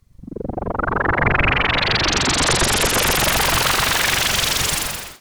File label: Whoosh, sci-fi